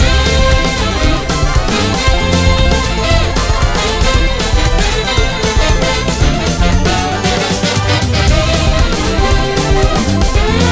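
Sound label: big win music, slots; pork knox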